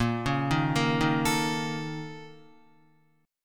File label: Bb Minor Major 7th Double Flat 5th, Guitar; BbmM7bb5; arpeggiated; JGuitar